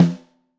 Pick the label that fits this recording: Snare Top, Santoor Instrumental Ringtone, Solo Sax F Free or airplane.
Snare Top